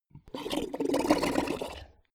beast gargle large hard

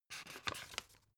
old pick page

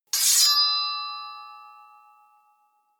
sword wield ringing bright

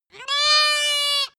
goat baa lamb classic

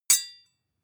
sword hit scrape soft

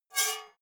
metal scrape rich fast

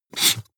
marker mark hard short

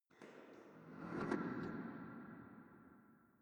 small impacts

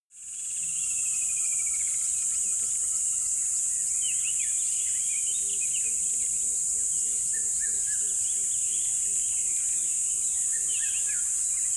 river bed dawn chirp constant

